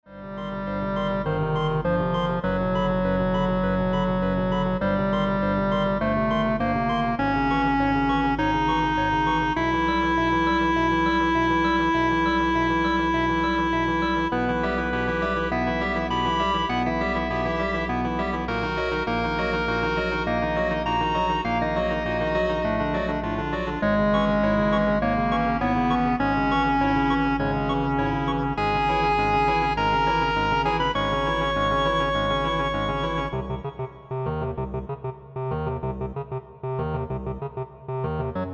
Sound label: Clarinet Choir